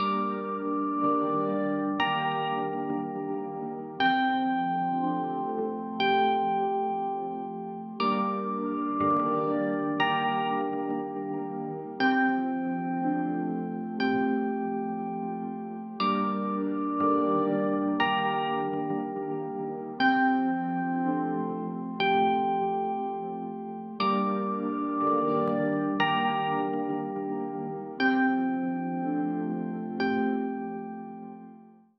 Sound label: glass window tears solitude, ambient; ガラス窓を濡らす静寂の雫